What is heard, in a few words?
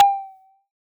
Perc, Dubstep One-Shot Drums